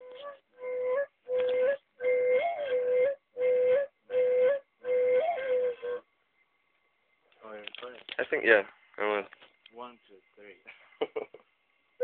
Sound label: bali mushroom flute